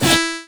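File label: real electronic hi-hat; USB Soundscan vol.33; AKAI; Disjointed Textures; DRUMATIO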